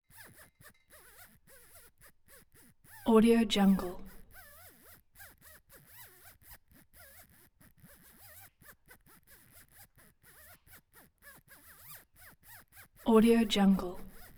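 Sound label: 15 volt DC motor resistance; 15vdc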